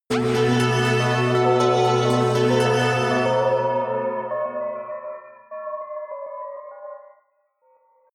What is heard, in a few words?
synth bells roll high, drones